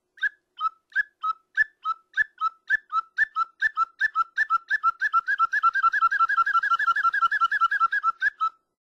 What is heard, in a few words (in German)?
kuckuck, Tierstimmen; Vogelstimme